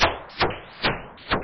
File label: loop, techno atmos